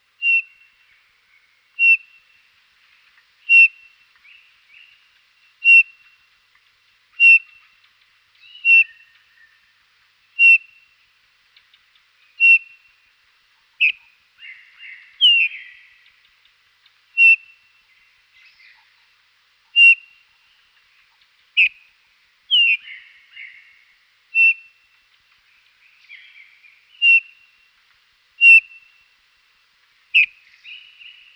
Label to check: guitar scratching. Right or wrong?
Wrong